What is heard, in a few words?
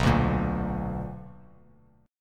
B Minor Major 7th, Bass Standard; strummed; JGuitar